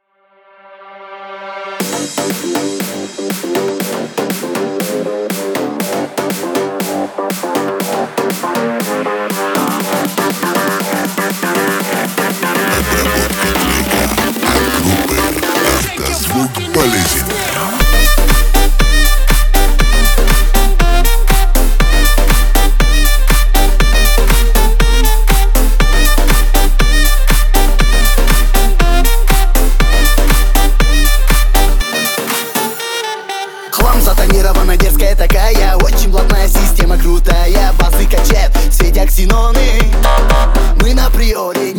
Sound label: 33-37hz Low bass; Remix Lada Priora